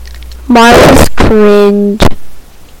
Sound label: cringe